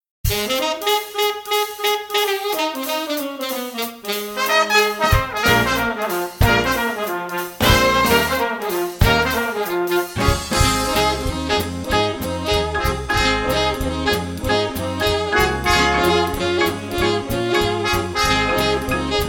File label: Swing Orchestra